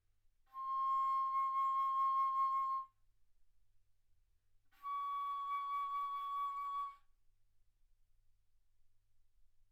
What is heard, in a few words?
Bass Flute C6 Db6